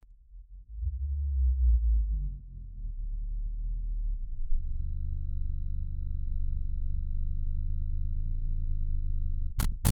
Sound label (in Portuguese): som de slow motion para; time